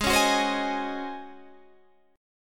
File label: Db Major 7th Suspended 2nd Suspended 4th, Ab, guitar